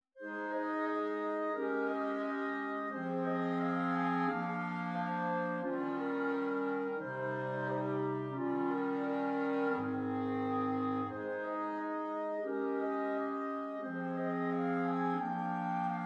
clarinet quartet

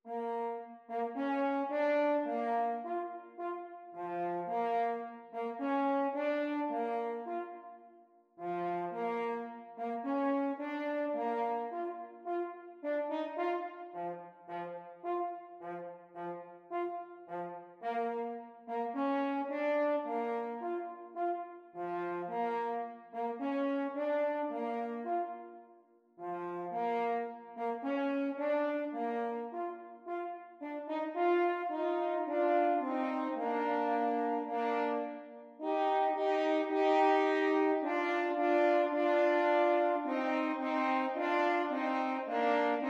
Horns Duet

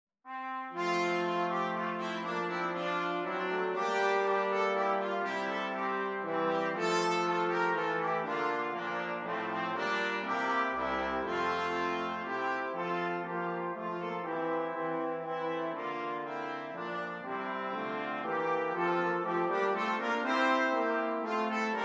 Brass Quartet; We Wish You a merry christmas

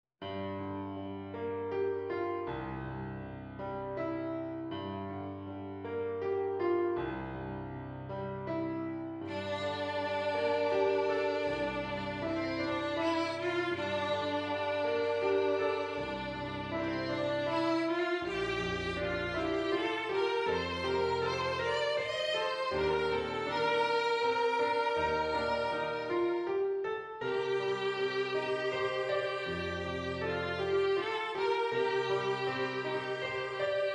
Beautiful Dream violin

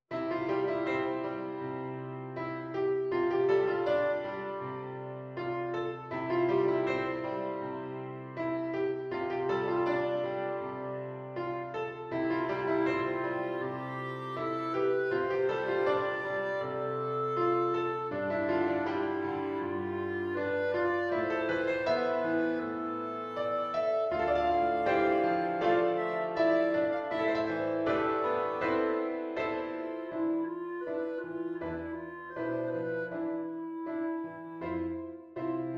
clarinet or bass clarinet

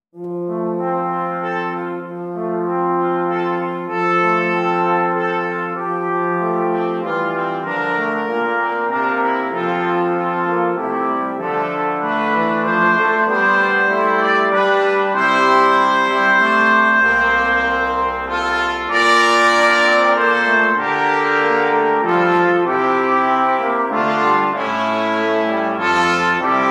Brass

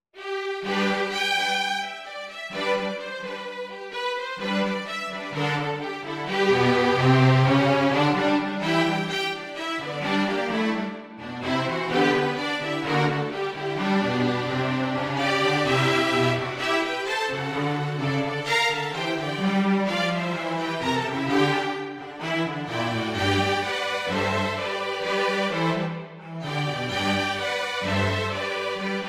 rock string

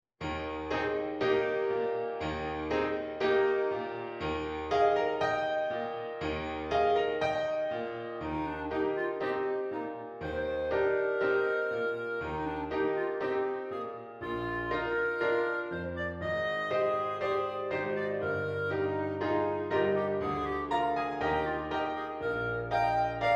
Clarinet; bass clarinet

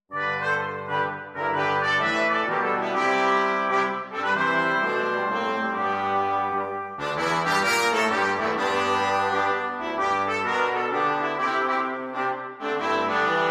Brass, jazz carol for brass quartet; Good King Wenceslas in 3

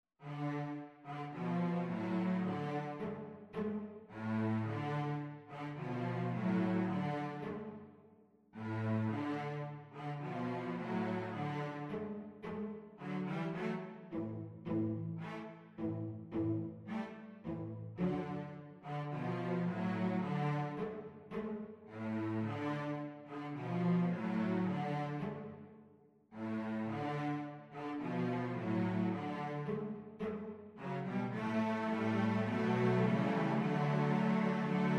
Cellos Quartet

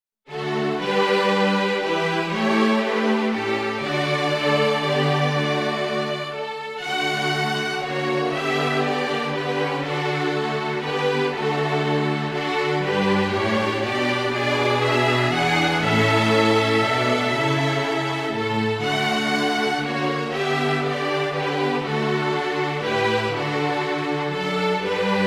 String 4; It Came Upon a Midnight Clear

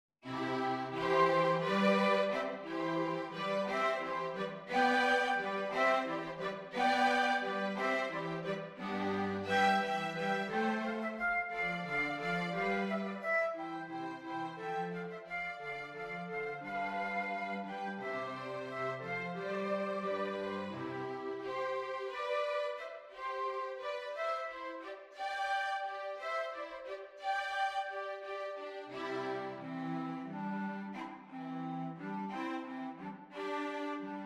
Hornpipe; flexible instrumentation; Alla Hornpipe; water music

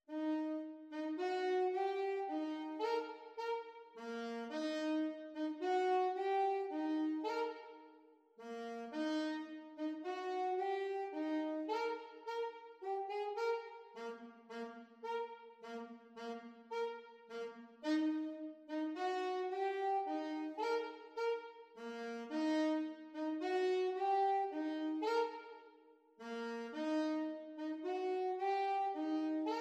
Saxes Duet; saxophone duet

